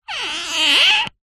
string rub wood squeak; foley

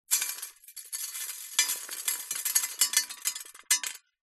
foley debris small fall onto metal

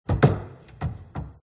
open garbage

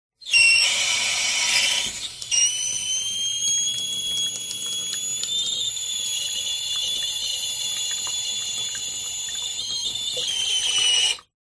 tap faucet on long hiss pressure loud; household

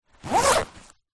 foley bag backpack zipper; zapsplat

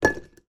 food apple slice drop on plate

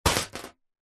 stone drop onto small loose metal panel; foley; zapsplat

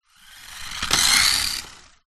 leisure radio controlled car pass; zapsplat

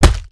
Heavy punches; foley walkers

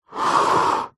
household plastic plant pot slide on wood surface; zapsplat

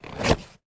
food egg box carton open; sound effects one

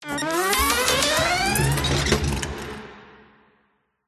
robotical power up sequence

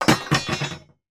imact metal steel strips drop on wooden floor

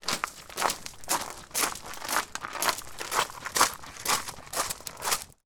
foley footsteps driveway shingle

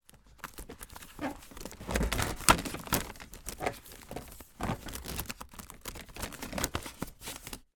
office rummage inside metal file box

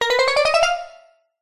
cartoon ascend happy cheerful child like

